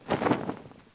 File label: Sail Wind; Civilization4